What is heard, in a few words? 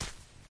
step; Smite; Xbalanque